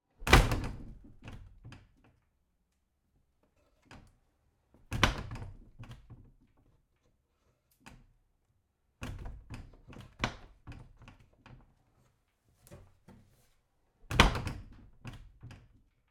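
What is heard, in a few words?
door bang wood swing broken, interior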